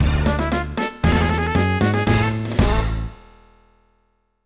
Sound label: Defeat; tokimeki memorial forever with you; saturn